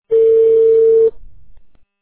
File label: ring